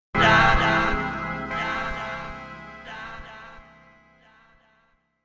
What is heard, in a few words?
Scifi Audio Logo Ident, Odd Voice, Surprising, Quirky; Lynne Music